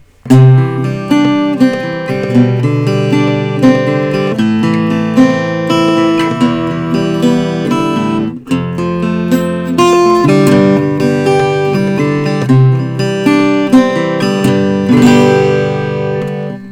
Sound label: preston thompson style 2 parlor